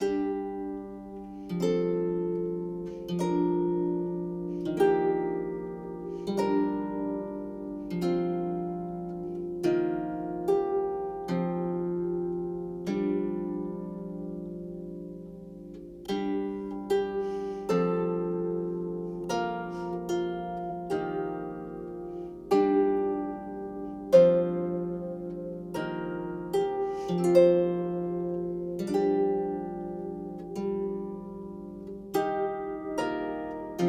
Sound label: Lute